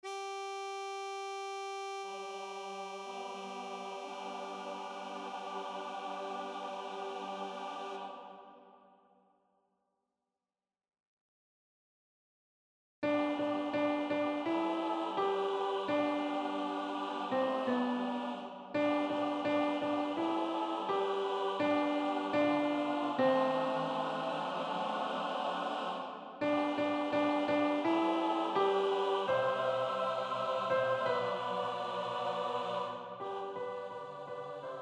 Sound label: Alto; Flows from Mary had a Baby